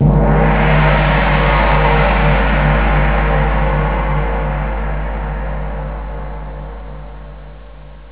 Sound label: GONG